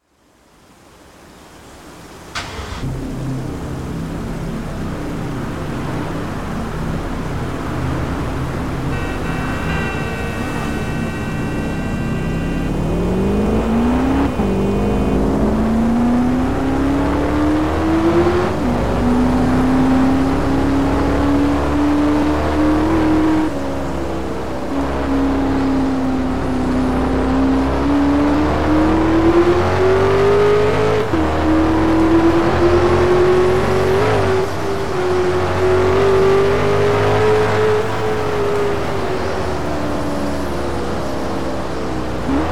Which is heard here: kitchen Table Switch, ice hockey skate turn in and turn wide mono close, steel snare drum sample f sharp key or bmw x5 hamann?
bmw x5 hamann